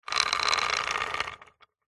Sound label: industrial chain small pull over thin wood hoist medieval